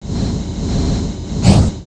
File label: Magic gain